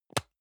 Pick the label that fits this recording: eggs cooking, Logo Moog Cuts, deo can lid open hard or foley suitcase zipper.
deo can lid open hard